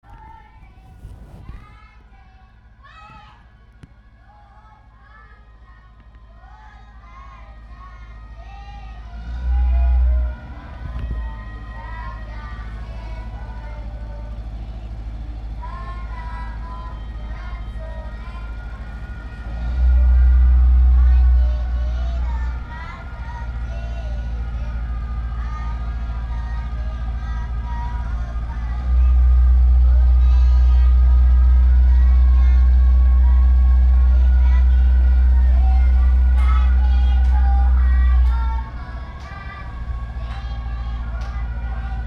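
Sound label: singing school kids tibetan, Kathmandu; Kathmandu Ambient sounds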